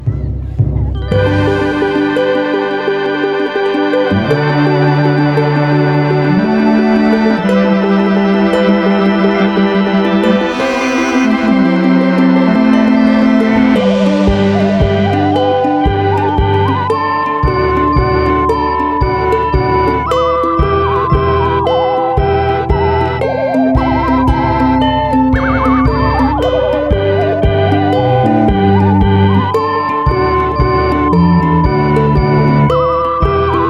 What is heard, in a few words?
Ukulele Concerto